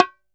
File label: BONGO OP AC; CUBA